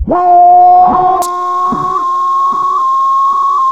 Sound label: VOX FX; Big Beat Samples & More